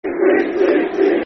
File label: Cheat, Cheat, Cheat, Football Chants; Plymouth Argyle Pafc